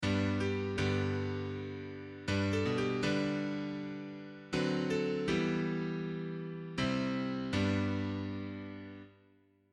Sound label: Cadence